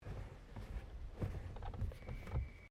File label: CAR HOLDEN HD PREMIER WINDOW MANUAL CLOSE, Classic Australian cars; 1965; Vehicles; SoundEffects-Foundation1